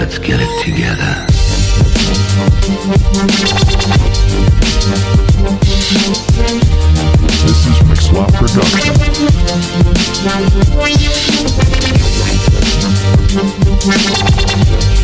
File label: Hip Hop DUBSTEP